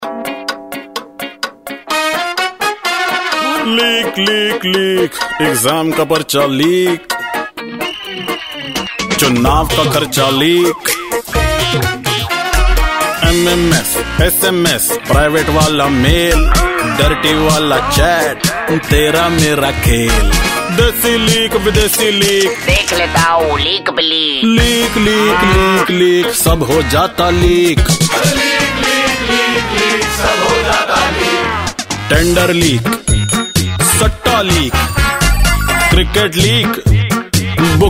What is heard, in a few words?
TV ad song; Prince Pipes